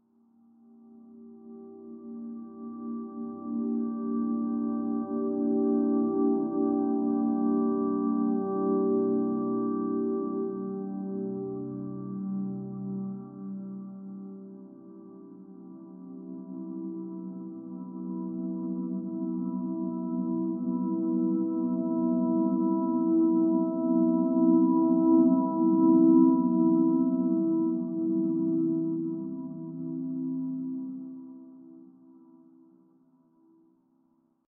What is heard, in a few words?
buddha machine